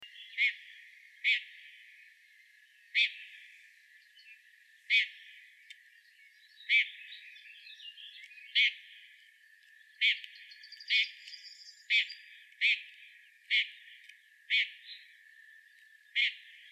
Tornskata

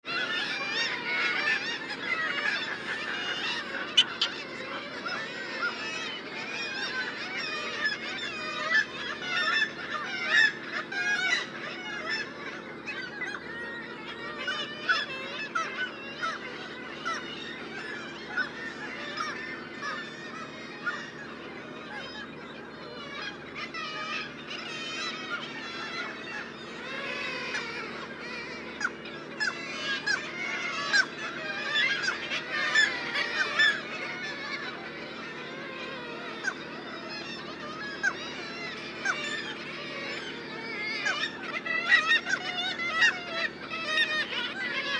Tretaig mas

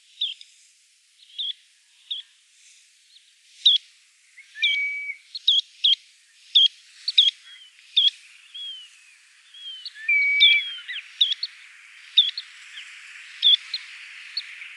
rodhalsad snappa

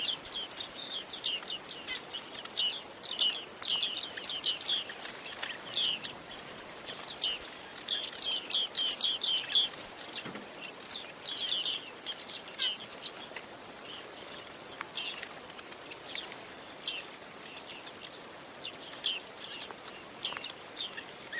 Skaggmes

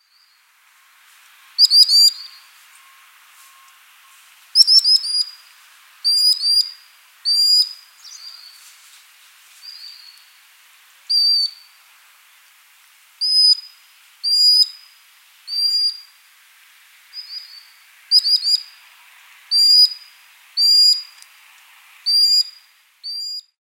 drillsnappa

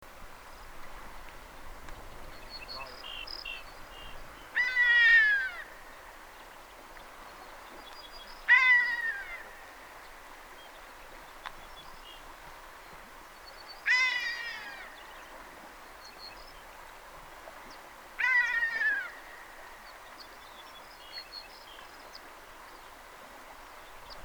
Ornvrak

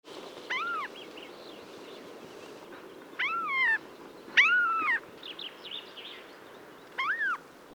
Lavskrika

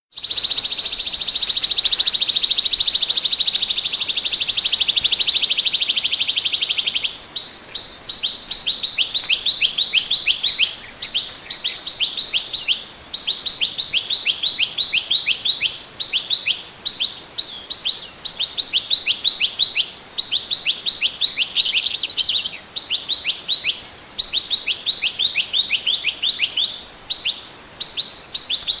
klippnotvacka

